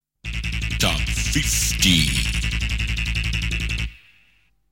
Jingle

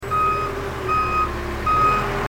Reverse

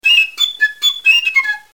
Piccolo

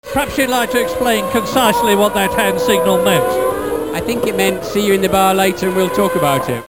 F1 speak

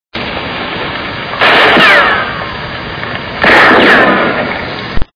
Ric gun

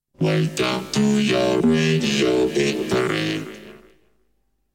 Jingle

